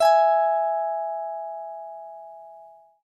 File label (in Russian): gitarnie tonalnosti struna g